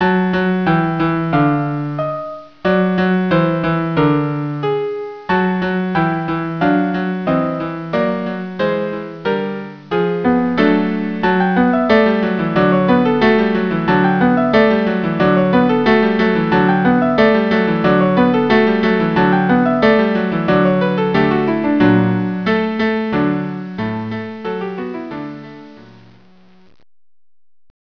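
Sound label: cello piano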